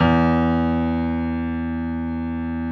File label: PF E1, G.PIANO; AKAI S-Series CD-ROM Sound Library VOL-7